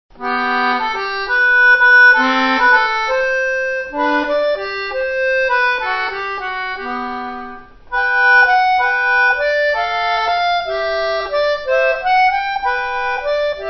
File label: lilliburlero, bandoneon